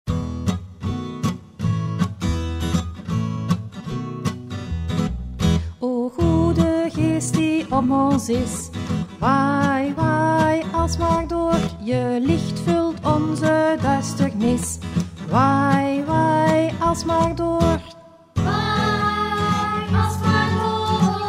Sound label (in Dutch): Waai alsmaar door